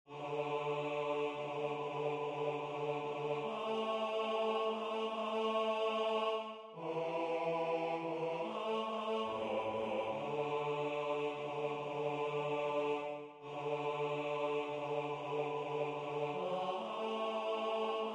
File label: Marianni bass; Midyear Concert 2026